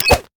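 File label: concussion grenade; LoL; heimerdinger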